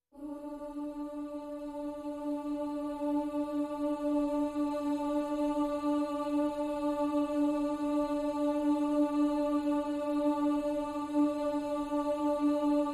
PADS Vocalicious (D)

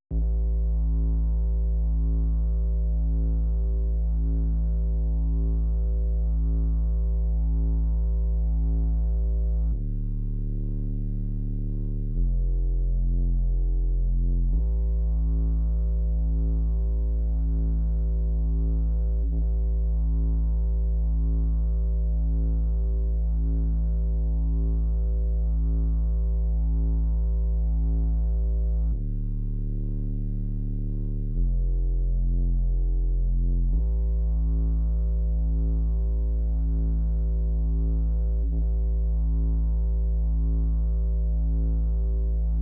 Sub

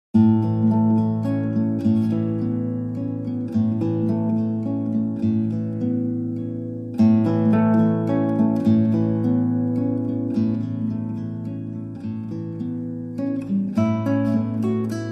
Solo Guitar

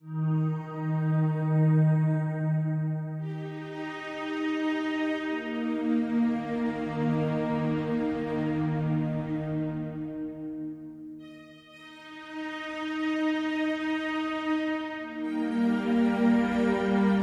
Abyss Drone, Strings

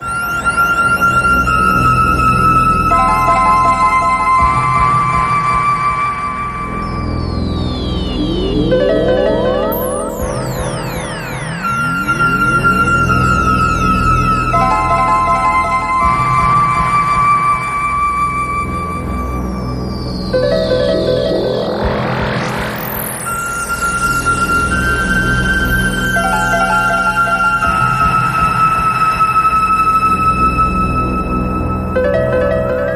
Spaceflute

